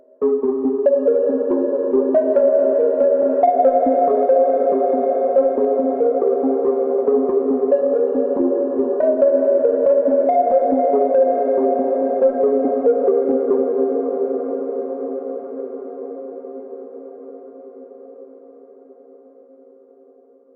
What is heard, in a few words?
melodic enchantment